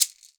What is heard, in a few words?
SHKR LEGG, Synclavier Percussion; Synclavier Percussion 2; World & Orchestral; ILIO